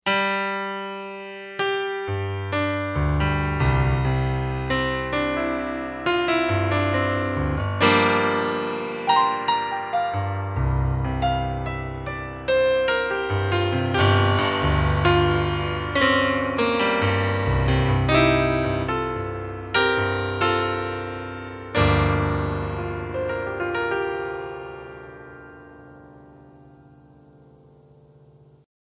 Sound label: Piano Sus